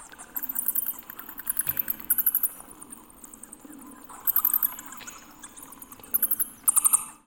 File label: amb animals bat squeak